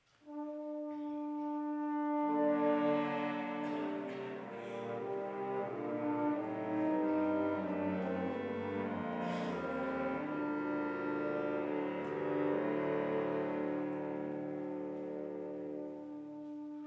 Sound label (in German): Chor und Choral; IV Die Verleugnung